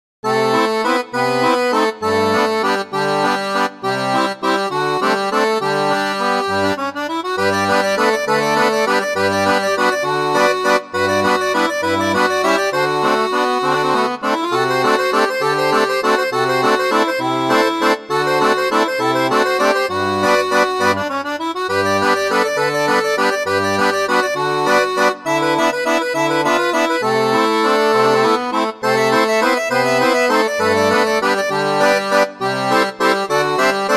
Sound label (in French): seraphone, orgue barbarie